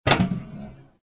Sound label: gate open; fence gate; movers; doors; Soldier Of Fortune II Double Helix; XBOX